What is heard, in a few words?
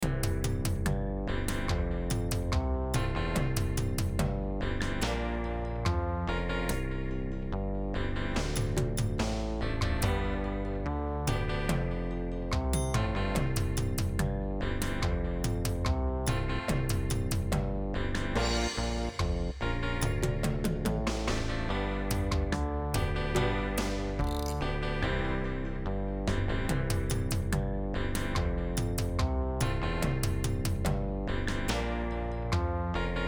percussive maxi tempo 144